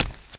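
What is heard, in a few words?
run right, foot; The Elder Scrolls III - Morrowind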